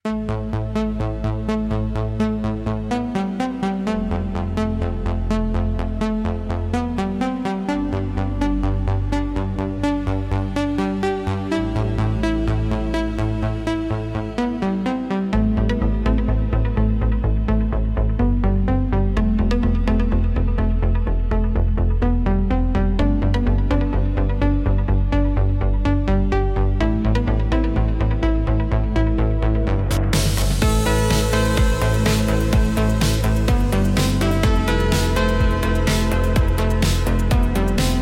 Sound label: Electronic Impulse